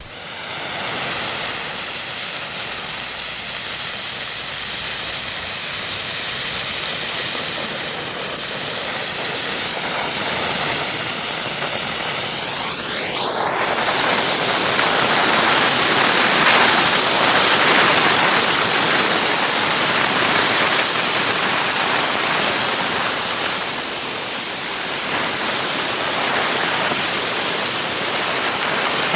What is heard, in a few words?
Street Flare Light; flares